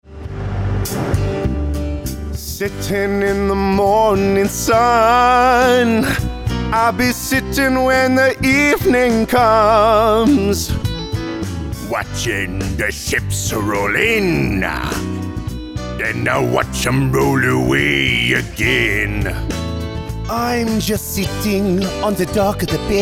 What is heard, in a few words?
animation men singing